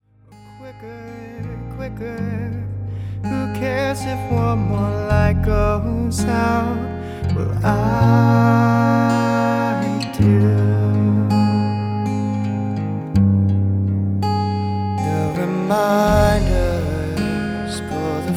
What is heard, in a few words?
Ballad